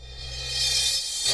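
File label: crash reverse, Dance Mega Drums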